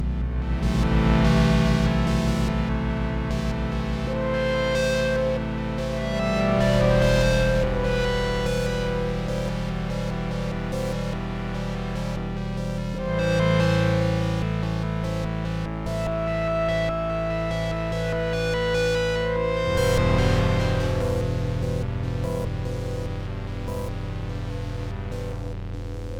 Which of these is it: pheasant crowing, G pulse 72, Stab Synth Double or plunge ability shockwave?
G pulse 72